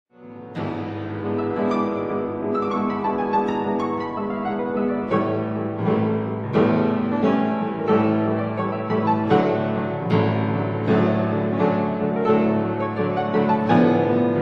Piano Sonata in F sharp minor Largo con molt'espressione